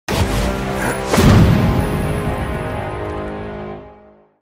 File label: Cinematic Music; Prosecution